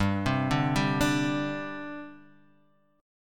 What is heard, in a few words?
G Suspended 4th, Guitar, Half Step Down